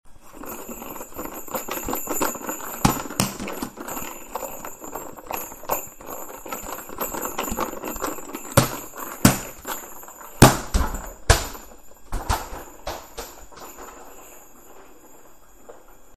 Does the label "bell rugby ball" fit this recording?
Yes